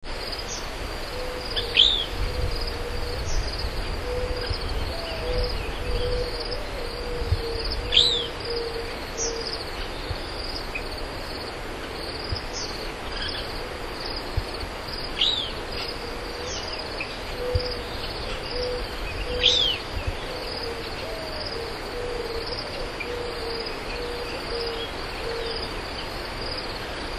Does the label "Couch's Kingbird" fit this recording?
Yes